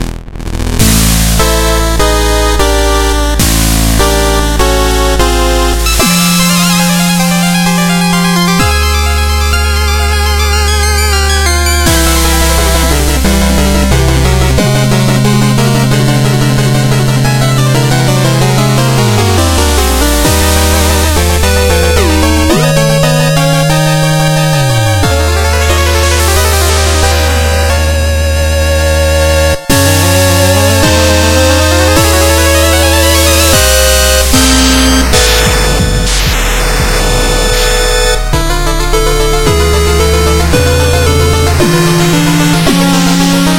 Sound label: Music Storybook